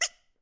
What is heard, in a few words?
quack